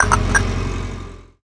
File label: Sound Powerup Constructions Trigger